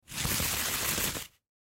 Rope Unravel Spin, Foley Rope Unravel Spin Twirl; Rodeo Sound Effects

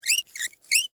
Metal Hinge Squeak, Foley, Metal, Hinge, Squeak; Latches; Objects; Blast Wave

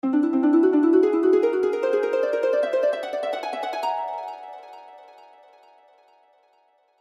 Harp Ascend, Harp Ascend Arpeggio Mystical; Strings; Blastwave

